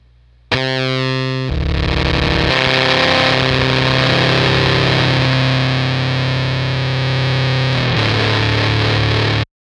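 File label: Fuzztest guitar fuzzfactory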